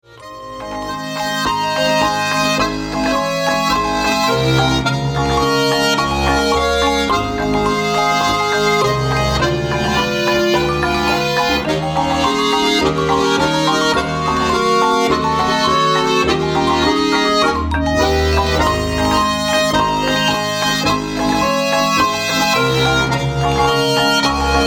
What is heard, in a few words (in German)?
Florentiner Marsch